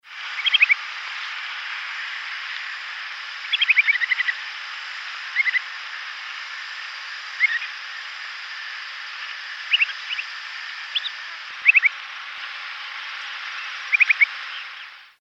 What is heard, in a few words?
Bartramia longicauda; Kuriy